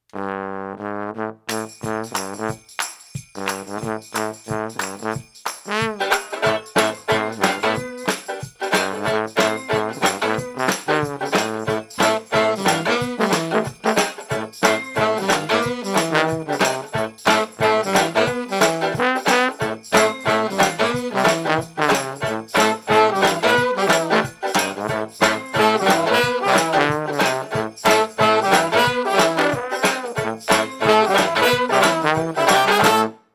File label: Happy Trombone with guitar lick